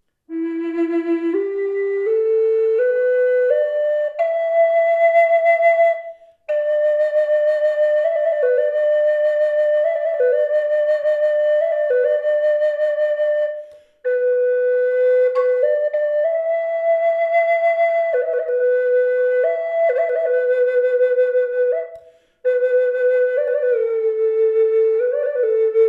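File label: reverb, flute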